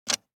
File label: DICE on Plastic, Grab, Standard, 3 Three Dice; Samples; ArticulatedSounds; DICE Sfx Party (Extended)